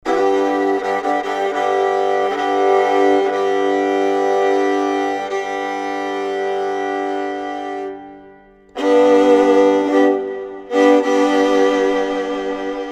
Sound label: Bridal Chorus